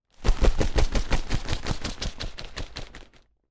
bird wing flap

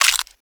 bite; munch

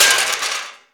thin metal sheet hit

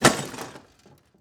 cupboard door smash

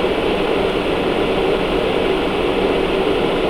electric fan run

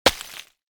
ice impact

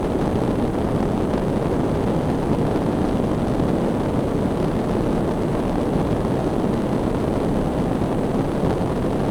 gas burner

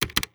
keyboard press

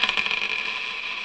coin drop; desk

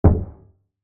empty barrel hit, drums

